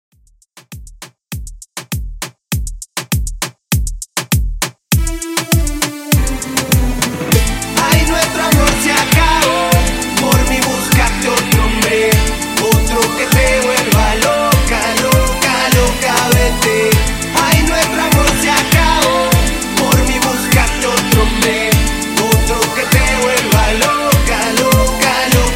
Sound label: Latin Reggaeton 100 Bpm Clean